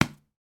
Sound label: sport baseball bat foam hit hand single; sound effects one